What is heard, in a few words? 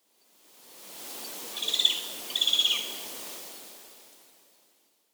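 collared scops owl, sakata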